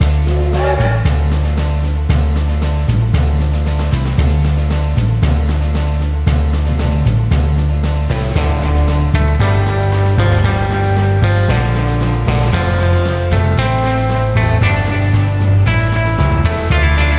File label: LOOP train music; Zen Pinball Party; Western